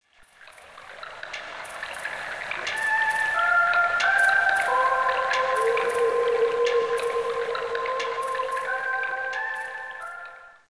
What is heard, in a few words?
Carillon